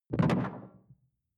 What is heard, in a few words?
sci-fi alien bubble; swooshes-swishes